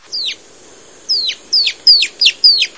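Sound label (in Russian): ускоряющийся свист мухоловки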